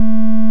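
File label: triangle; JaySynth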